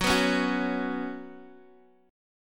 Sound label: F sharp Suspended 4th, Guitar, Open G; down